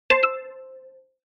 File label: Pluck; Motorola; Moto C XT1756